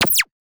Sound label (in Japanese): プチュン トランジション